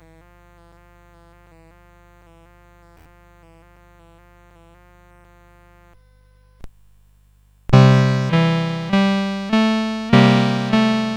Classic Foundation Beat of Good Old Rock and Roll, CSG8580R5